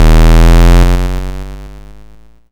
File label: YM Bass Fm, chip; ChipShop Deluxe; ChipShop Hits